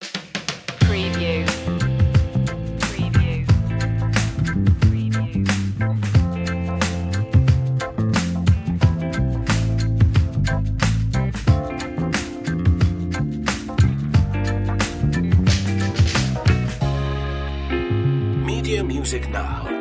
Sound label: jazzy chill relax